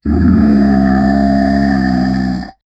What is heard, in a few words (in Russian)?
ryk dinozavra, ferocious